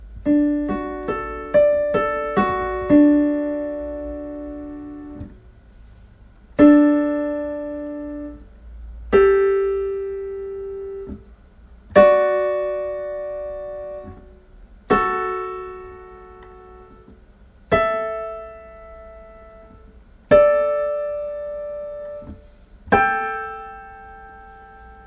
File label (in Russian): интервалы